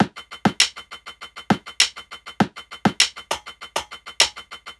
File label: Percussion Loop (100bpm)